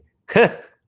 happy guy laughing huh

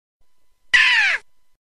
death sound; blockland

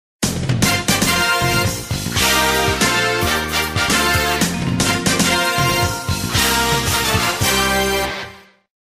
stage clear music; sonic cd us

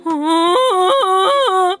polka

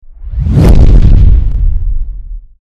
bass

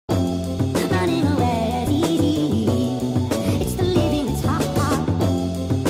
song; adult swim

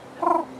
raven grasnar

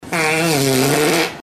fart

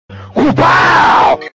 wapow sound effect; vanossgaming

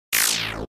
sound effect attack; undertale

